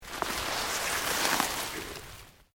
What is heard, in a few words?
Plant Leaves Large Move Slow; Material components for constructing more complex sounds; Rustling